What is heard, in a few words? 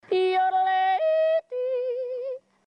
Yodel